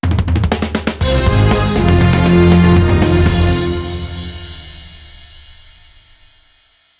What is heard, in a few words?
Win; Level 3